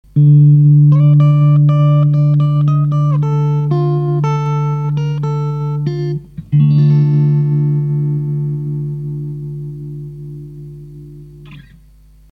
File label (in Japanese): TREMOLO TURTLE ON, 揺れてますね？揺れてますよね？ / でも、異常なほどハイ落ちしてます。; 左ツマミは音の切れる速度、右ツマミは / ダイレクト音とエフェクト音のバランスを可変させます